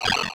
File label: Science Fiction Sci-Fi Electronic Ray Gun